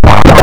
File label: FX GTR, GUITARS KIT; Big Beat Samples & More; AKAI